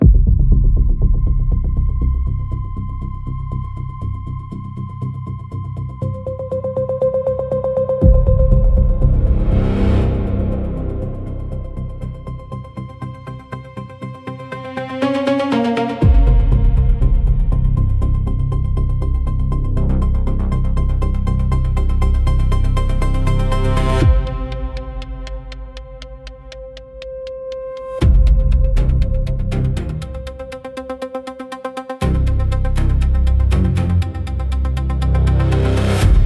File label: high tension suspense for confrontation; puzzle solving and tracking